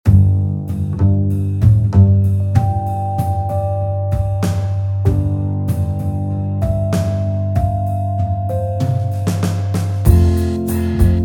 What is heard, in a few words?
drummer solid kit